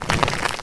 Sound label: fall; temple2